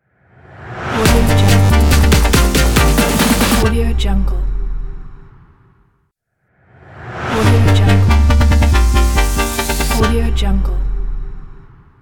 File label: future bass logo